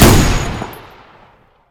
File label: candy fire; candygun